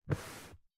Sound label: Office Chair Up